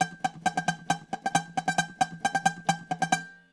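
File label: bouzouki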